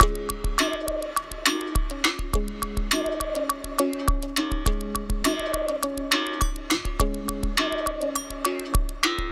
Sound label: Terrestrial Hybrid, Processed Percussion